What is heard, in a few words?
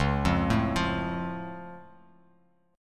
Db Augmented Db down Bass Standard; arpeggiated